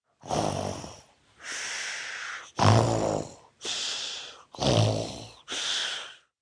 Snore Loud, Snoring, 1 child, loud, snorting, exhaling, human voice, kid; Breathing; VoiceBox